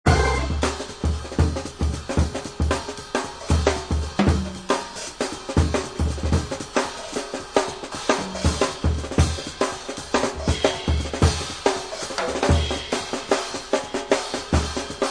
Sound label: chinoiserie org groove, drum loops and breaks